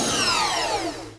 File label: output disconnect